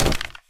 jump landing, player; source engine; left 4 dead 2

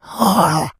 become alert, infected

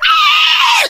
squeal, infected die; npc; Left 4 Dead 2; Source Engine

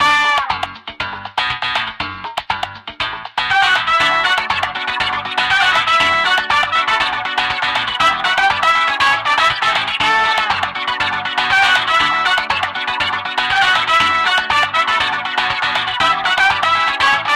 looping radio mix, music; video games; ambient; source engine; portal

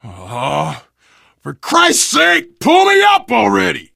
ledge hang, biker; video game; source engine; left 4 dead 2; survivor; voice

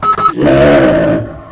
hunter alert, ministrider

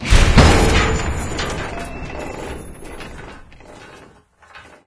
stalker shakes; source engine; episodic

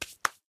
hands; taunt; player; yeti standee; sniper; source engine; tf

